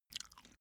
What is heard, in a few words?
cat eat single